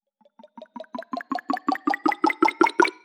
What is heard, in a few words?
Riser - 161 BPM; FX; Spike Lee